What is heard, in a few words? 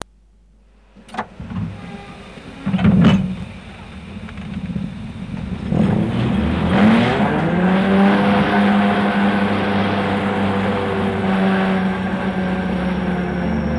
Chatswood Wheelslip; The Sound of Legends Part 3